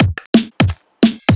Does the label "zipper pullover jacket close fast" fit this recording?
No